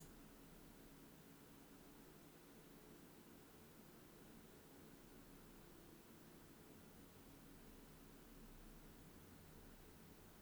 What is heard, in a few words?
mdrill noise